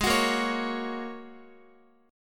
Ab 7th Suspended 2nd Suspended 4th, Guitar, 4F,4A,5C,5G,5C,6E; x,x,8,11,10,9; strummed